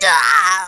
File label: die